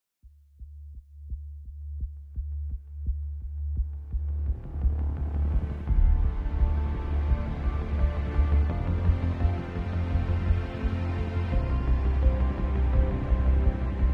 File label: Atmospheric Epic Trailer